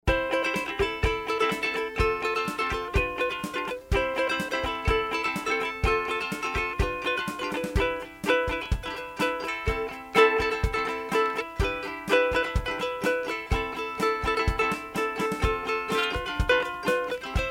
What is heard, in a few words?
uke